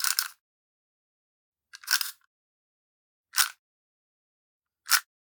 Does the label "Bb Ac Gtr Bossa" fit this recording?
No